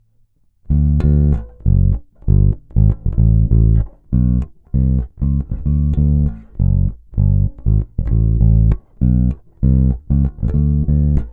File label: Soul Mix, basses